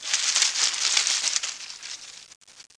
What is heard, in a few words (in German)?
Plantage; Anno1602